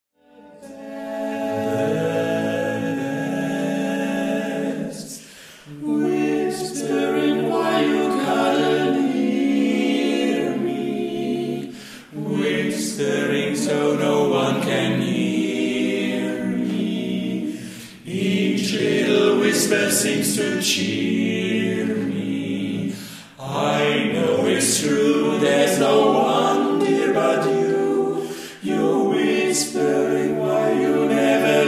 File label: Whispering